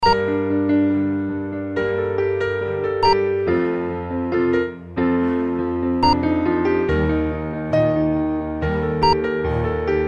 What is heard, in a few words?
Sweet Ballad